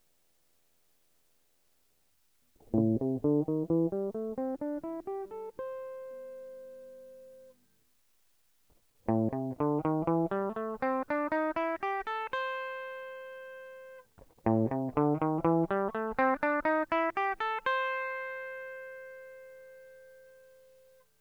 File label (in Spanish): Tono, Guitarra